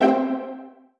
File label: Success Violin Pizzicato Three Note Bright Wet stereo; xbox series xs